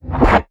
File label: GUI Transition